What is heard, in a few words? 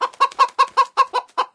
Sfx - Hen Panic